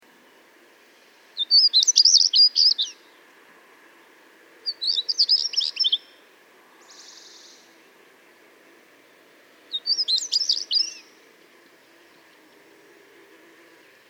tarier patre chant

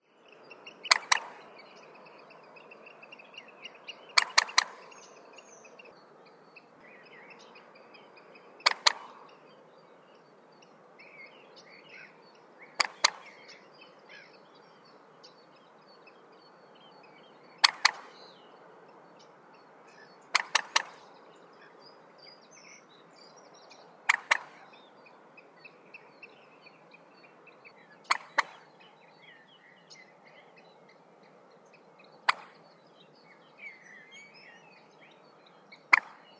poule d'eau appel

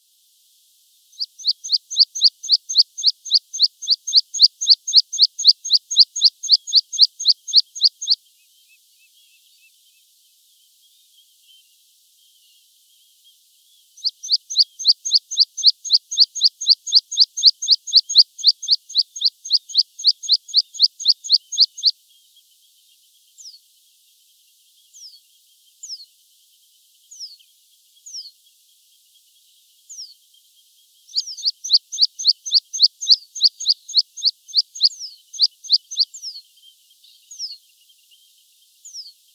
pipit chant

